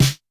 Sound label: snare; Reference Beat BPM-130; Pophits